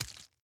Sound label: Attack Bug Spit end; we are the dwarves gamerip 2016